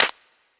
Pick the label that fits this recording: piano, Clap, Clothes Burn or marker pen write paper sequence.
Clap